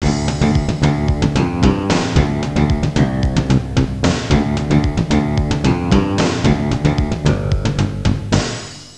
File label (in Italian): suonerie musica rock